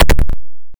bounce echo; big tower tiny square

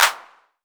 clap

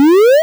complete

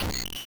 big block fall; big tower tiny square

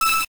saw; big tower tiny square

